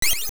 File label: Drop Larva; Gohma dropping larva; Zelda Oracle